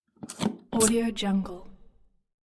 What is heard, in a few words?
objects household soap dispenser